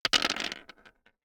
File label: DICE on Hard Wood, Throw and Roll, Standard, Four Dice; Samples; ArticulatedSounds; DICE Sfx Party (Extended)